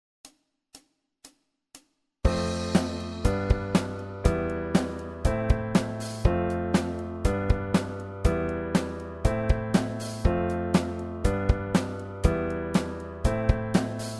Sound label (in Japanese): C JPOP進行